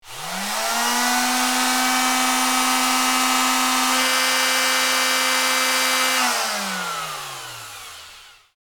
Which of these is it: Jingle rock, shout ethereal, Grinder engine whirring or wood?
Grinder engine whirring